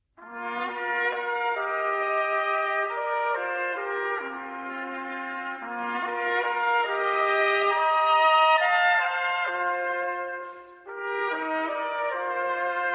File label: Fanfare